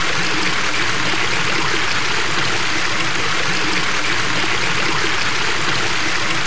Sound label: Stream Water; Seer; GamigoNA